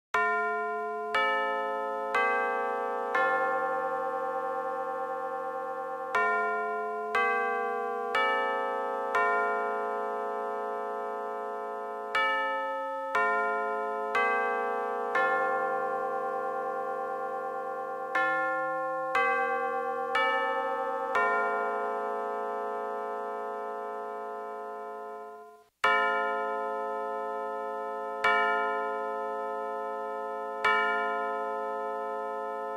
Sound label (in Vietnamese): chuong dong ho qua lac keu